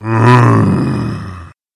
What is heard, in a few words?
monster undead mage